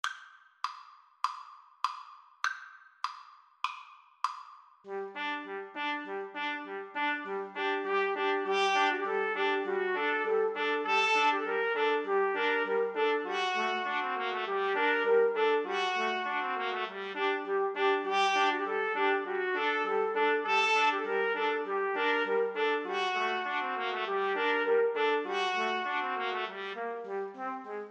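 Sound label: trumpet trio, karaoke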